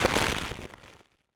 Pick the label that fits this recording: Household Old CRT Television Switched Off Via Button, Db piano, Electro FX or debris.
debris